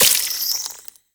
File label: Reroot Baby Resource Pick Up; Warframe; Nokko